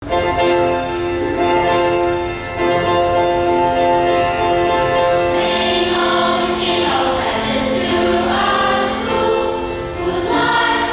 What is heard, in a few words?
School Song